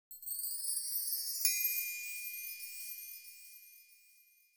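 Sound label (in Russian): ветер куранты свежий и бодрый переход; multfilm golova fon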